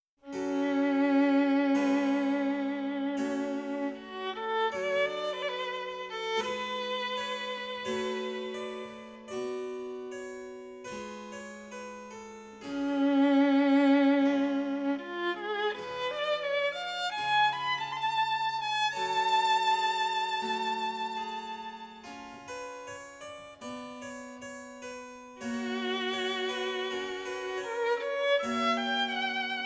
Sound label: SONATA NO.1 IN A MAJOR ADAGIO; DENON HIGH END HI-FI RECORDINGS; HENDEL; OP.1,NO.3,3RD MOV.